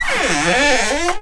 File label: Creaky, household rhythm samples Hits